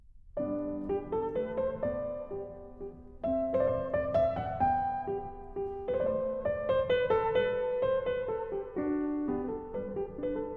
Minuet no. 1 in G major, Piano; formerly attrib. J.S. Bach as BWV Anh. 114

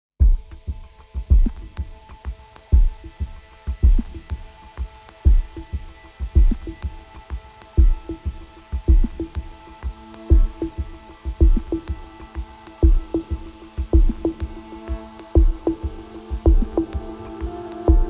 Sine Waves

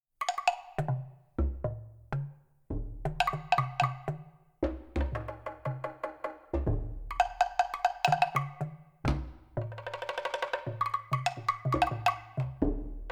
Distant Drumming Continues (Irregular); Return to the Blue Lagoon

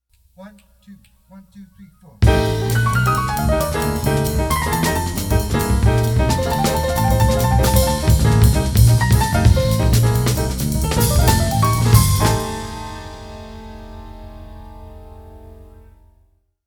Fast Piano Jazz

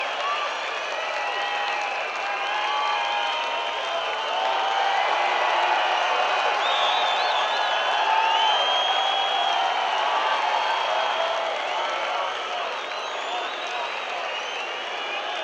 encore break; Milton Keynes Bowl, Milton Keynes, UK

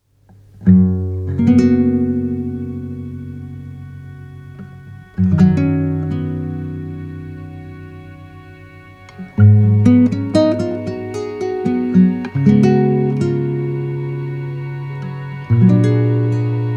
Lullaby (instrumental)

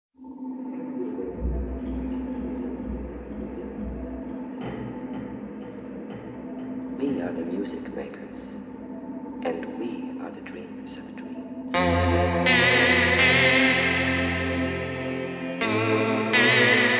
instrumental

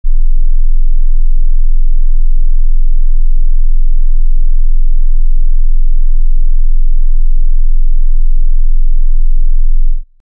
12 HZ; Tone Pak

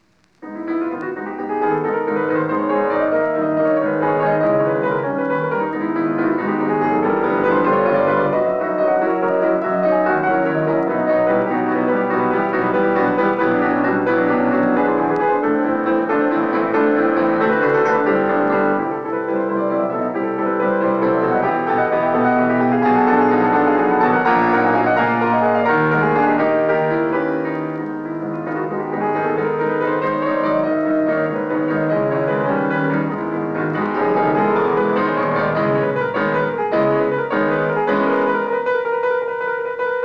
Prelude in G-sharp minor; Frédéric Chopin

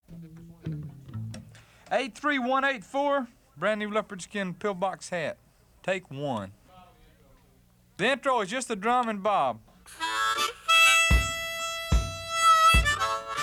rehearsal

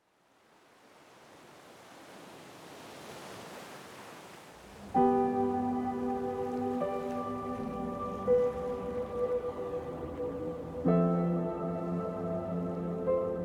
Soundscape

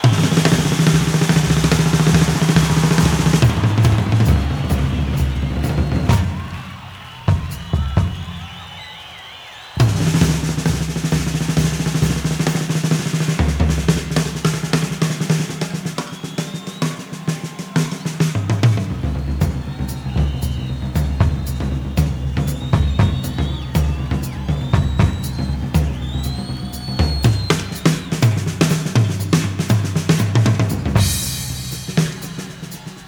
Drum Solo, Live

